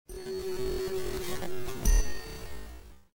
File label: metal scrape; zps; Ambient